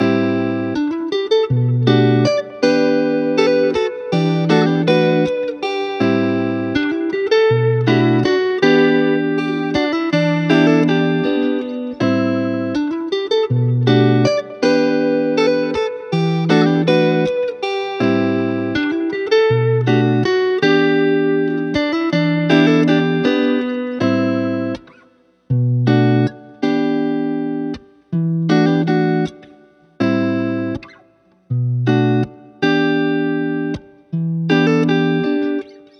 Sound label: 160 BPM E Min, Guitar Loops; Faded Denim; Cymatics; Journey Guitar Loops